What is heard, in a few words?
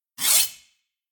Swipe